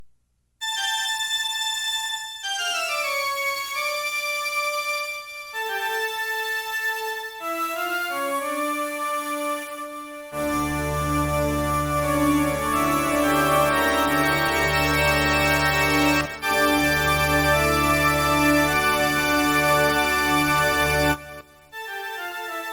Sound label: toccata and fugue